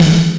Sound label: Snare Rev; percussion; SDs; SamplePool3